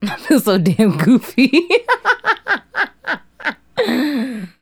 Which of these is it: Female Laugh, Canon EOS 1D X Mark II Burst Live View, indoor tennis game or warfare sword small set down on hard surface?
Female Laugh